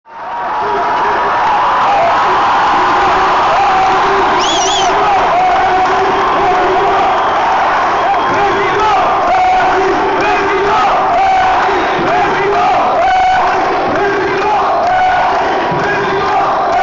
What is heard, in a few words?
Ambiance, Meeting; BCE Mellassine